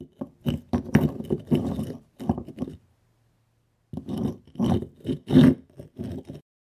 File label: Stones scratching